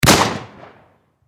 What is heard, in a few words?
Warfare Shotgun Winchester Model 1897 Pump Action Single Shot Close Perspective 12 Gauge